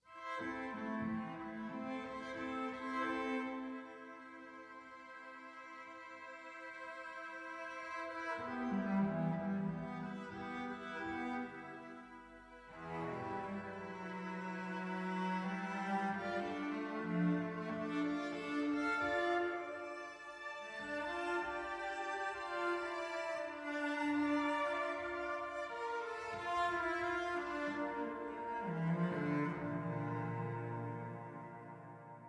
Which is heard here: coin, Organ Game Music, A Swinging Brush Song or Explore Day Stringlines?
Explore Day Stringlines